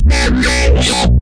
dubstep harsh bass; Basses

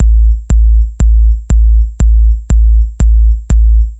ringing 4 on the flook kicks, 120 bpm

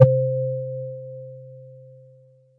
lo vibey, Roland TD 7; DRUM MACHINES

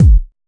lofi kick

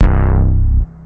fat reverb; Basses

